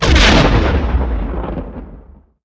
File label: exp medium generic